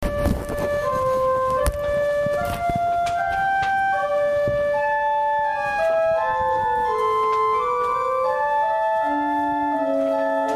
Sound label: pipe